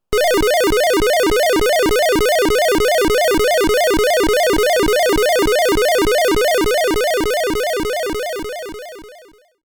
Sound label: Walking Sound